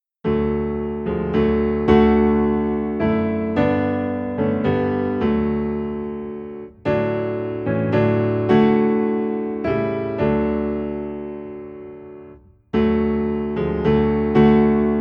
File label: Piano Low in D, hymns; Jesus I Come